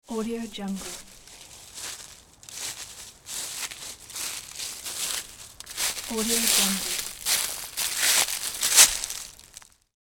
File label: walking in forest; audiocellstudio